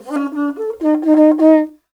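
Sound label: Afro Horn Phrase; Roland LCDP11 Africa VOL-1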